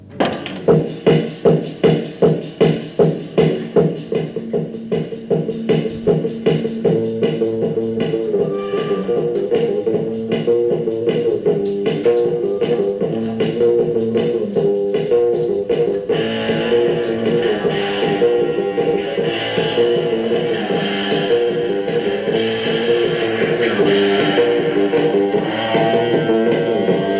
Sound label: Untitled instrumental